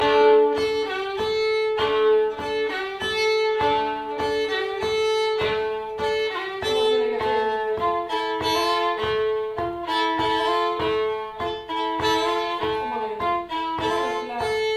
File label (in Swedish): Polska bara komp